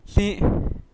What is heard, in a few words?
horse